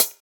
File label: Closed Hat; Ghosthack Advent Calendar 2017 Day 5